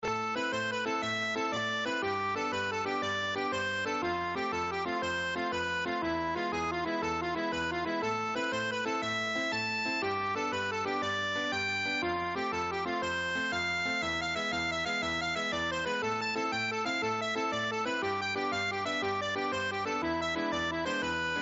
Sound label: jig